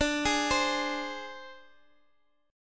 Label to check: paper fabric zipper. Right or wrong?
Wrong